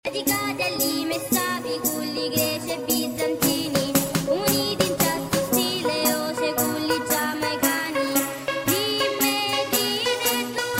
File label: Afro Song; meloboom